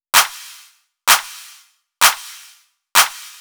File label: Clap, 128 BPM; Vengeance Samples; Vocal-Hook Kits; Song Kit